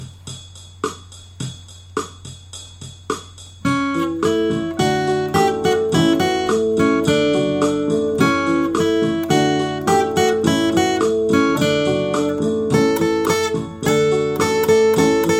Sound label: gowri nandana instrumental, bhajan songs